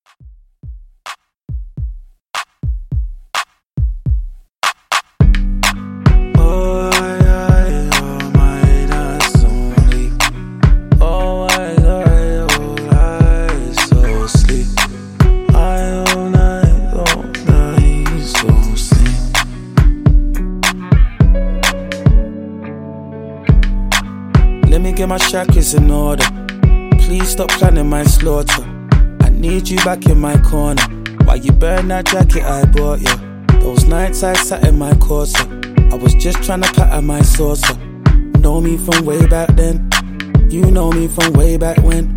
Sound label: Mainstream Pop Redrum; Remixfreakz